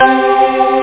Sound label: strings